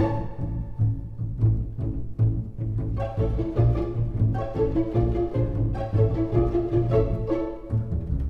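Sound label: ocean world music glouglou suspens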